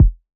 Kick

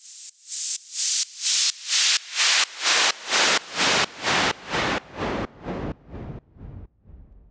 Fall Side Chained

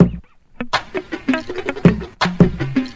Loop

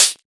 Closed Hat

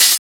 Open Hat; FLPRO

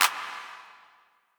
Clap

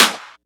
Clap; FLPRO

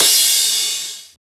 Crash

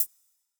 HiHat

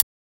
Transient; FLPRO

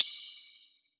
Ride; FLPRO

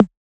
Percussion; FLPRO

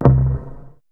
BASS

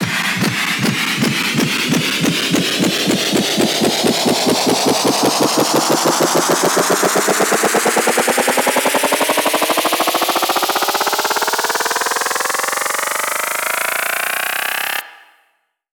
Loop And Noise

